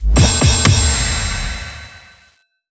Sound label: big Win Text